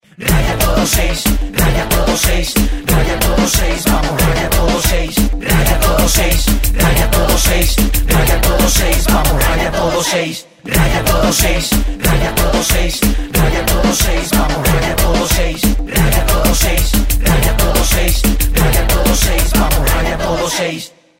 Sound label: jingle; Raya la 6